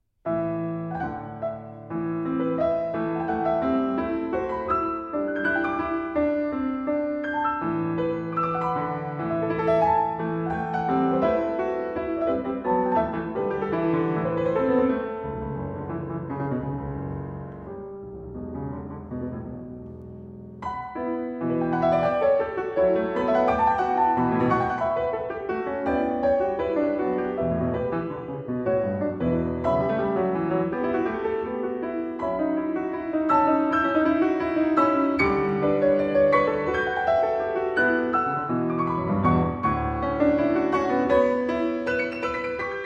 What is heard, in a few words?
Piano Sonata, Allegro in Halling; Piano Sonata No. 1, Op. 4, Draumkvede